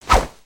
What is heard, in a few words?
swing miss; foley; minifig